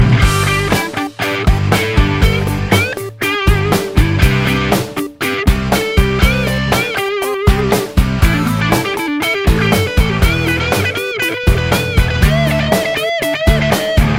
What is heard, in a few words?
play guitar